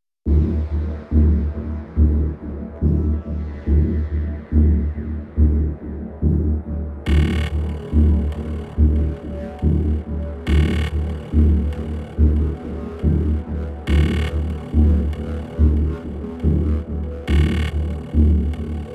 Nero Synapse No Drums; Ninja Tracks

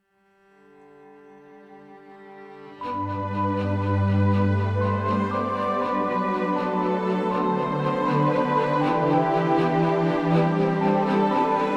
Ambient

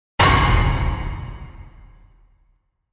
Pus Attack; Gothic Storm Music

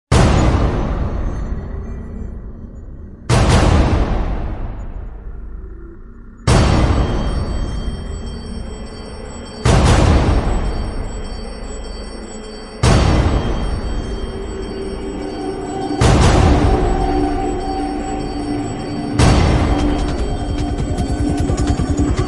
Drums Only Version

